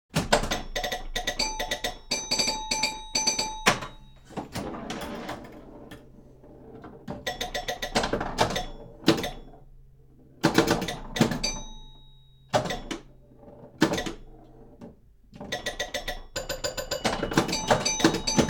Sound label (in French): Flipper mecanique, bruitage; Electro-menager